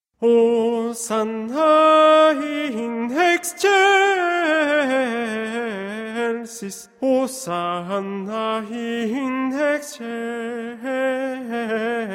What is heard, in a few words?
tenor; Benedictus